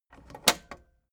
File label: tape deck close soft